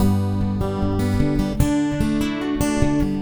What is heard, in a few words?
Picking G, D 74